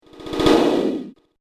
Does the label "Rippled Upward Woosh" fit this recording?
Yes